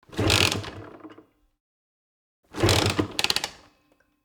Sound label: Keeway Foley Start Pedal Fail, TX50 Moped